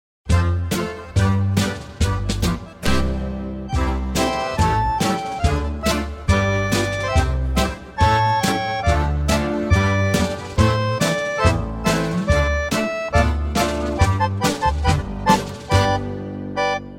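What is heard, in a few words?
instrumental version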